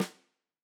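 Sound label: Tam Snare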